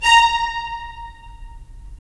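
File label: strings violins, left; Roland - LA Composer vol. 4; 90 s Sample CDs; Violins Sh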